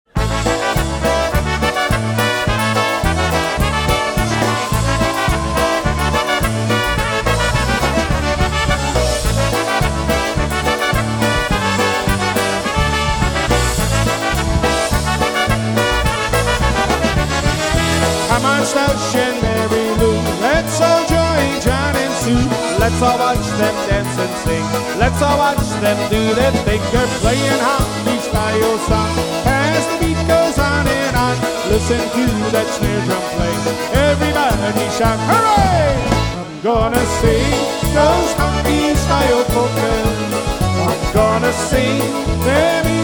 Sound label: Honky Style Polka Live Jam